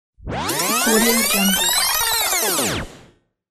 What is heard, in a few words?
rewind; unlimmedia